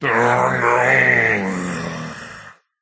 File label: Groan; Cowboy